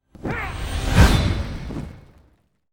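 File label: elder demon attack, monster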